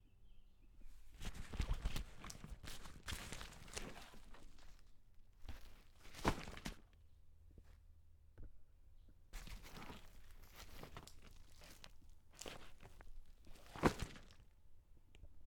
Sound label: Dropping Bag